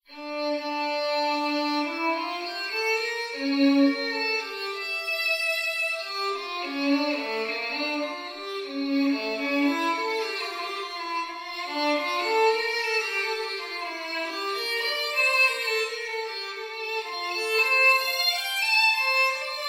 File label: violin; Bach